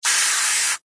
household water jet spray from hose on flip flop cleaning med burst